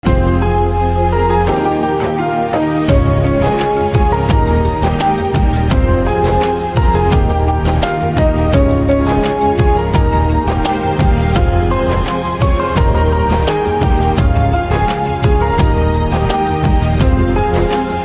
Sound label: Piano Instrumental Ringtone